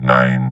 VOX VOX, Electro Vox

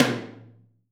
TOM, DRUMKIT; Best Service; Hyper Dance

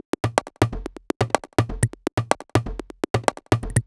Top 124bpm, House Drumloops; Zero-G House Fabrik; 90 s Sample CDs

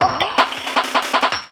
Fill, Drum N Bass Percussion Fills n Breaks; Sonic Foundry (Sony Creative Software); Knutrix Drum & Bass

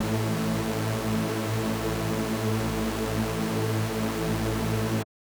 SuperSaw A3; SuperSaw1; KG MegaSaur; M8

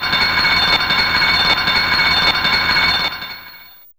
Dish, Fairlight CMI IIe; Effects

